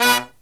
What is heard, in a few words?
LOW HIT, Brass